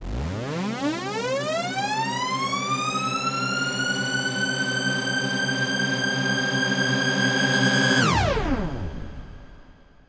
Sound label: Force Riser